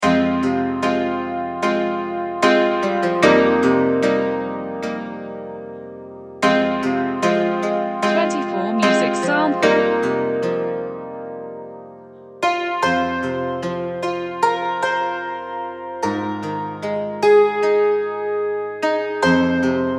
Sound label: hackbrett